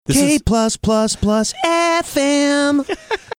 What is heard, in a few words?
singing k plus plus plus fm